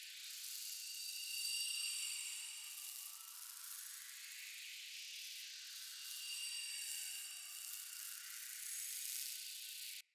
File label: Talisman Spirit Butterfly Loop; Cosmetics; Founders Talisman